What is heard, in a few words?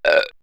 Comedy Belch; vox mix; X-Static Goldmine